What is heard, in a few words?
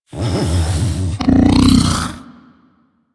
Vocal Breathe Slow; Warframe; Hunting Critters; Undazoa